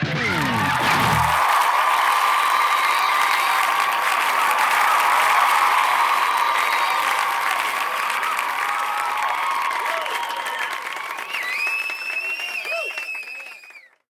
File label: start; fofix; MegaLight GH3